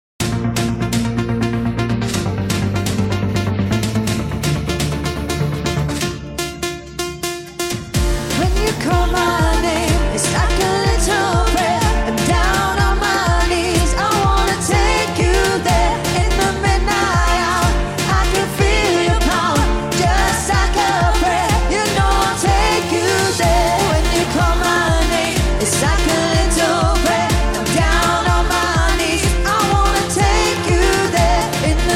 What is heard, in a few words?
vocals